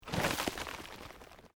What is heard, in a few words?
Earth Loose Move Short